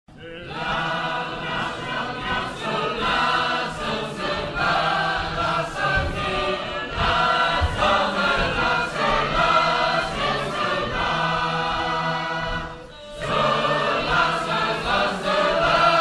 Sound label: Sacred Harp; Portland; 2006 Convention; Wondrous Love